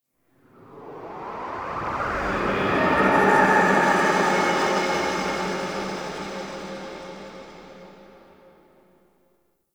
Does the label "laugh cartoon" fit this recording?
No